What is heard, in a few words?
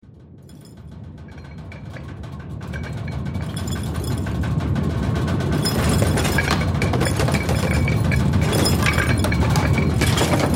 roof rattle; inFamous; PS3